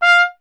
TP F; Miroslav Vitous; Solo Instruments VOL-1

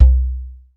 SI CAJON, ETHNIC PER; AKAI S-Series CD-ROM Sound Library VOL-5

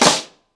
End Snare 90BPM, ACOUSTIC DRUMS; Sonokinetic - The Roots Drumloops

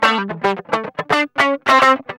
GUI, LICKS; 110 LICKS

